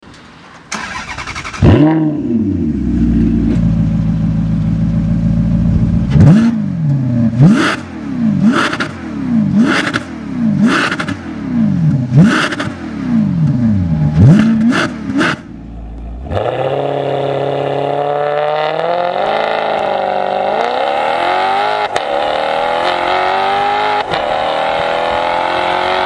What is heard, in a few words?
Maserati GranTurismo MC Stradale; cars